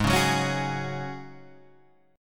Ab 7th Suspended 4th Ab

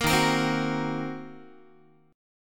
D sharp Minor 6th D sharp down Guitar; strummed; JGuitar

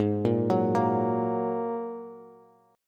Ab 7th Suspended 2nd down Bass; Ab7sus2; arpeggiated; chordshape; JGuitar

